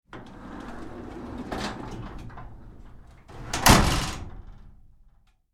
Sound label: household door garage metal shut interior; Metal Garage Door Shutting - Internal Recording; McKinney Sound